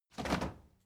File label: step crowd march quiet stop; schritte